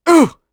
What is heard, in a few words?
attack voice; UFC Tapout; XBOX